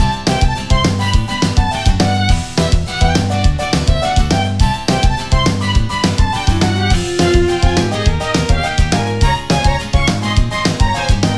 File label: Main Battle Loop; eternal radiance ps4 switch windows gamerip 2020